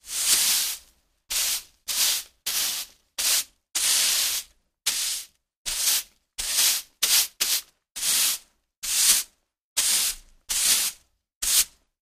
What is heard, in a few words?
Sweep Floor Normal; brooms & mops